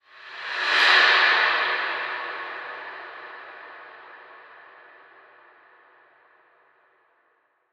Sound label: Small Swoosh